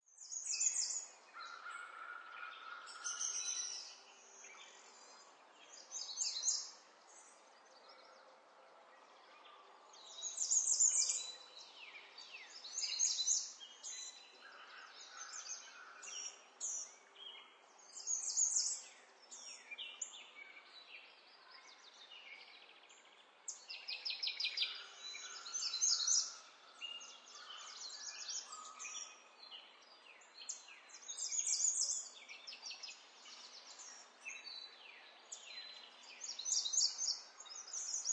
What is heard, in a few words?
Nature Birds Morning, Idyllic morning ambience in park, light forest or residential area; Peaceful, few birds singing cheerflly; Lynne Music